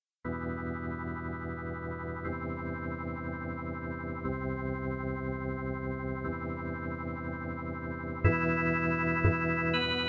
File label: hammond; Hammond tonewheel mechanism; there's a kind of Leslie effect going on